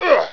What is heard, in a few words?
pal pain, player; Hexen2